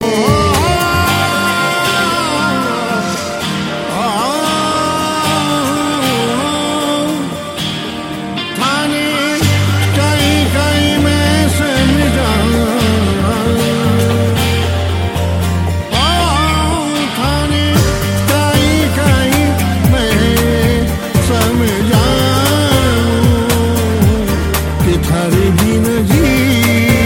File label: Best Aalap